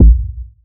reverb steel kick drum sample a sharp key

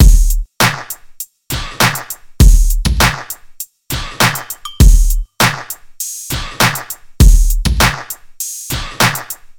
100.1 bpm gangsta rap drum loop D key

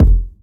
natural bass drum single shot F sharp key

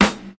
clicky steel snare drum g sharp key

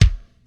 kickdrum d sharp key; one shot

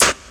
80s dirty hip hop snare single hit g key

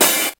high quality natural rap open hat sound d key

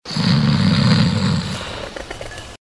snoring

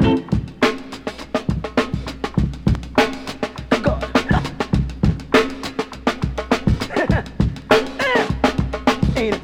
102 bpm modern drum groove c key

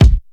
low bass drum one shot b key

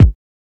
00s boom kickdrum a key; kick drum samples

